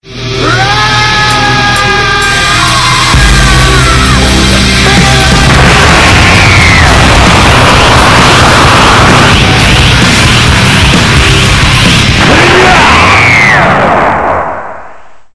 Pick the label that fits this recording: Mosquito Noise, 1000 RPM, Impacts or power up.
power up